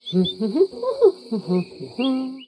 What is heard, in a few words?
hum